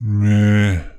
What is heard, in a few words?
Meow